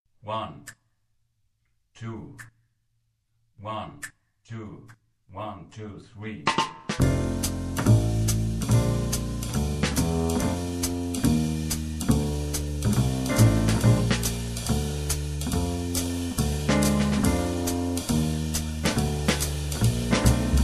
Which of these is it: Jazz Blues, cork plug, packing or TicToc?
Jazz Blues